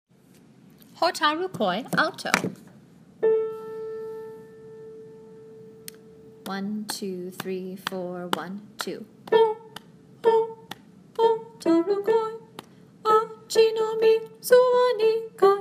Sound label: Hotaru koi Alto, girl choir practice